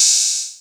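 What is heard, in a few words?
Analog OH; Foundation Drums; Ableton Foundation FreePacks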